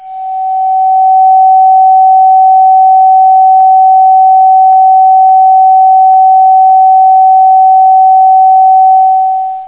one kHz; da capo vol1; instruments; whoosh

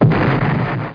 EXPL; PC Gamer IT CD 31; ARMRCMD

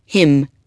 hymn

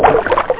WATER; extinction

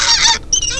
squeek; Happy Easter 1.0b1

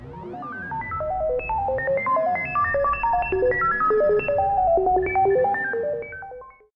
AMB; ambiance

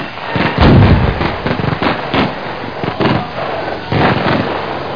melee

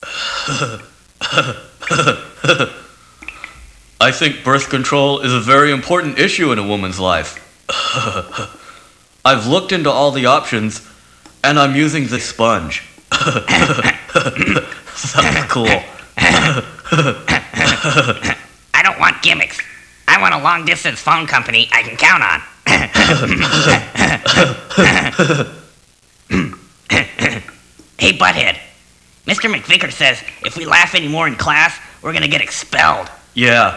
laugh

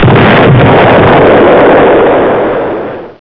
Demon; Kinga 2.0

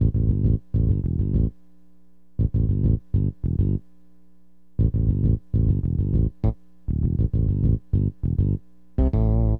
BASS, HipHop; LiveAct Pool 1

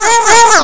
Gecko Appears; Apeiron 1.0.2; Apeiron Sounds

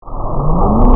up zoom; aerotoons

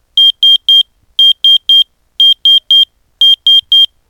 Pager; pa lite

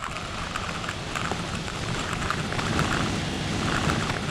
tux on rock; TuxRacer

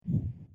flap; dungeonmaster

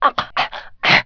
gasp; PC Gamer IT CD 41; Daikatana; mikiko

